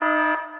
alarm, programmable speaker

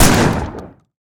pump shotgun; fight

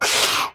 biter roar, creatures

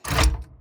gun turret activate; fight

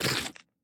biter roar mid, creatures

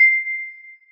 celesta, programmable speaker

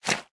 decorative mud, walking

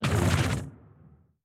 biter call behemoth, creatures

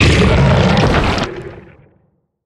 worm death big

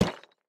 build concrete small; Factorio

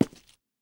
rails, walking; Factorio

